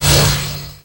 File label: eye hit; Fate The Cursed King; MONSTERS